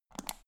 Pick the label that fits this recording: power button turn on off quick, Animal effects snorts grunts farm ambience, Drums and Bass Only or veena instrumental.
power button turn on off quick